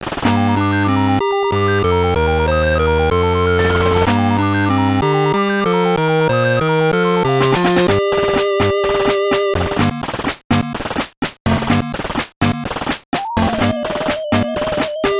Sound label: BGM, WonderSwan Color; dark eyes millenium 2000 battle gate